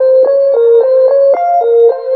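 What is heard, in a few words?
extra lead (spooky); Sonic Foundry (Sony Creative Software); Ugly Remnants VOL-2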